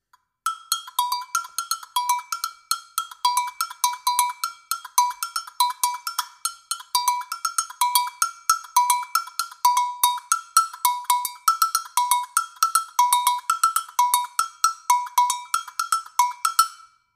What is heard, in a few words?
Agogo